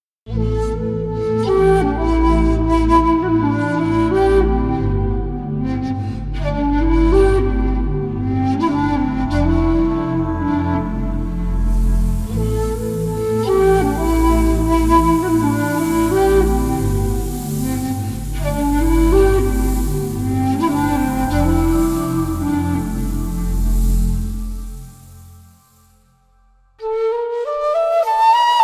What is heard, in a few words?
ethnic flutes and whistles; samplephonics